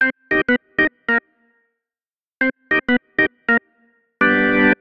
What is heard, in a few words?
Latin Org, organ